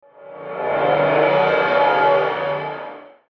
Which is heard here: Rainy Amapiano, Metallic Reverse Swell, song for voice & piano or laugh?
Metallic Reverse Swell